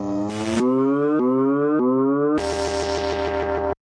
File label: slide glitch; Ze Franks Pain Pack